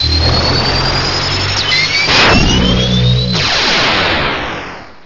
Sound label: Aeroblast; pokerogue; battle anims